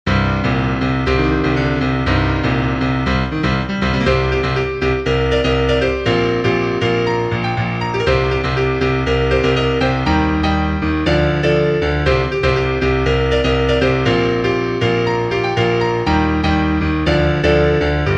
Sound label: Piano